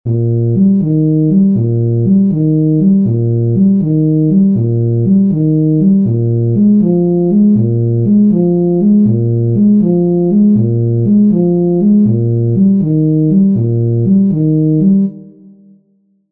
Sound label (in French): Pa Poum; Basses et Barytons